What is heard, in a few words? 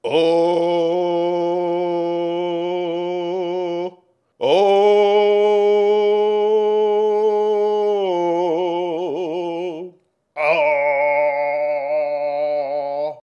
Alchemist sing; Hollow Knight: Silksong